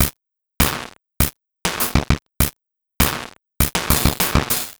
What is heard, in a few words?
Noise Beat; Drums & Drones